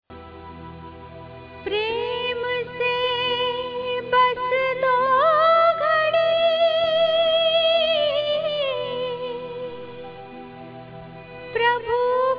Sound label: Slow